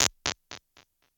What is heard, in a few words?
282 Delay TAPE, Impulse Responses; Reverbs; PastToFutureReverbs; SST; CLA; IRs